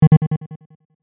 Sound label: classic game action negative